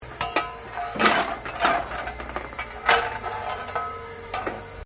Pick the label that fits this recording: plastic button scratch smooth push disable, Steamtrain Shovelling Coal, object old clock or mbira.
Steamtrain Shovelling Coal